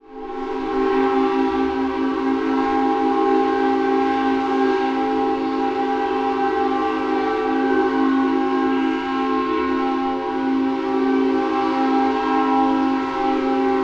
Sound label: Steam Whistle